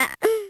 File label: baby